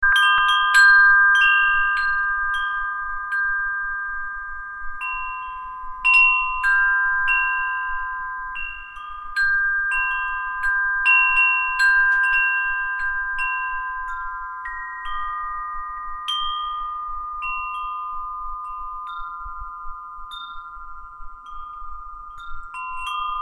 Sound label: windchime